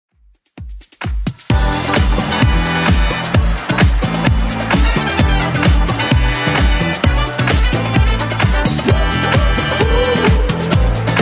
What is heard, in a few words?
70s Club Edit 130bpm